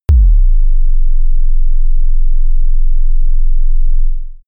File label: sub kick sustained